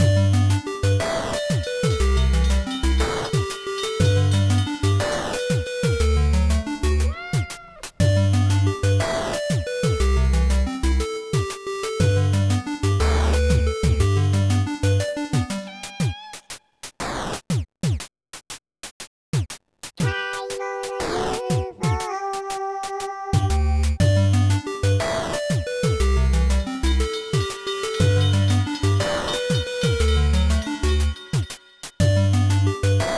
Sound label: BGM Shop; Part Time UFO; Android